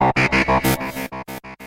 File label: Fantastic Tone